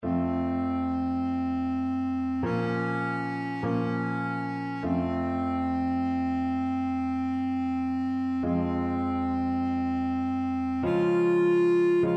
clarinet; purcell

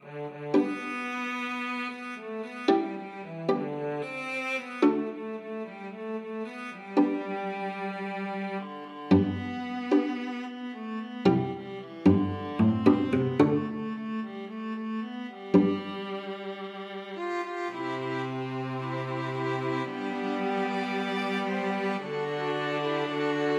string quartet

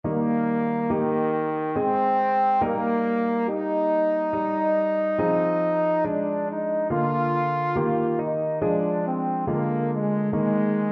sonata, trombone

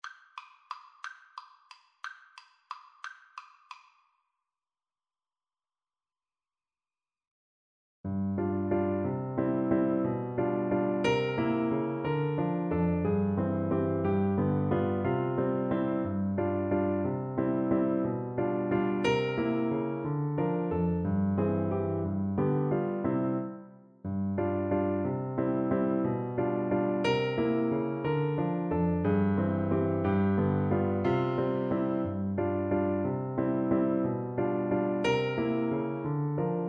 Waltz Recorder; Chopin; Op18

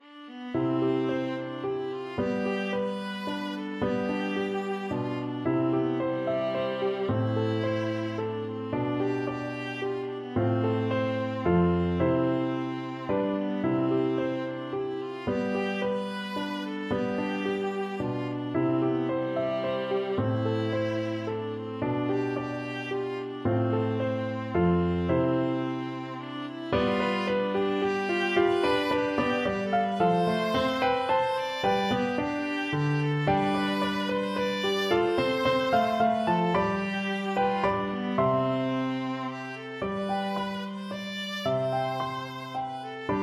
i am sleeping, viola

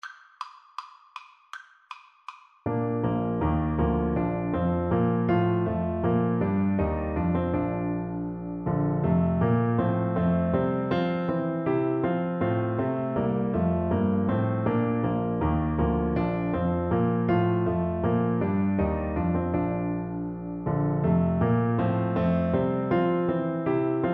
water bouree, Trombone

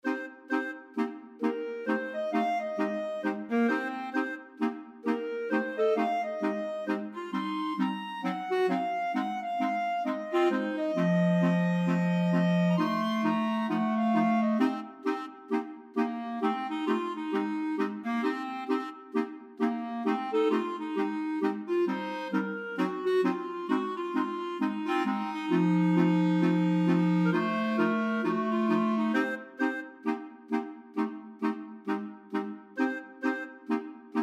5 clarinet, clarinet choir

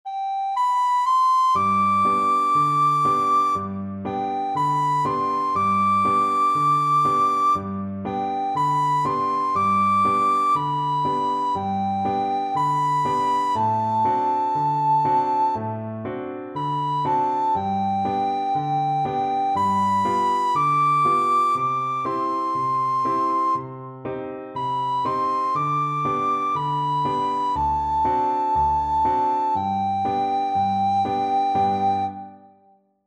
when the saints, recorder

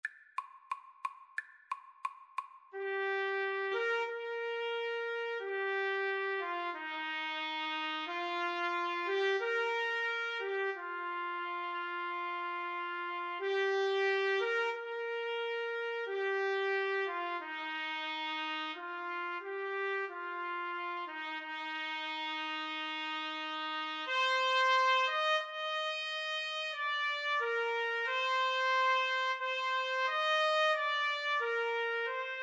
trumpet duet

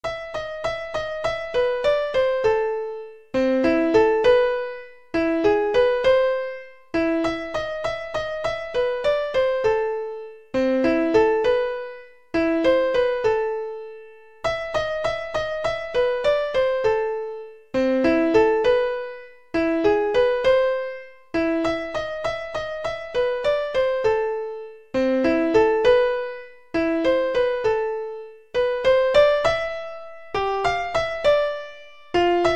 fur elise, keyboard

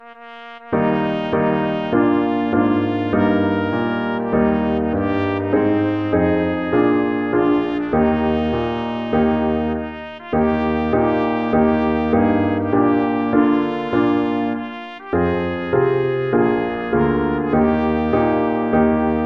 trumpet solo; la marseilleise

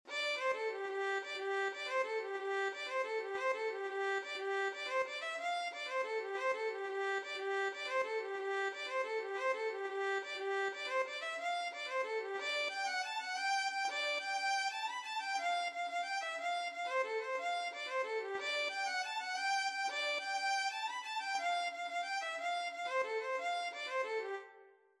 whack whigs, violin

